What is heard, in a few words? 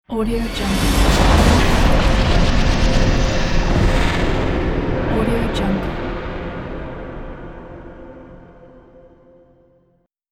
wizard magic ambience